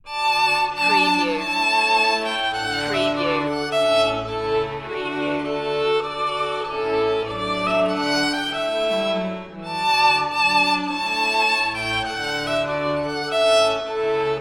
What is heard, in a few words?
trumpet tune violin; purcell